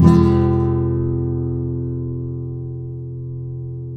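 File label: nylon guitar down minor F#, flam chords; Spectrasonics; Hans Zimmer Guitars VOL-2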